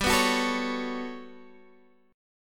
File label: G Major Sharp 11th, Guitar, Drop D; chordshape; down